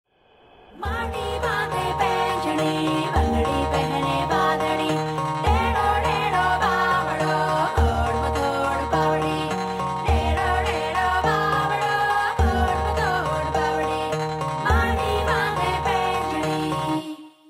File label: Rajsthan Tourism Ad Song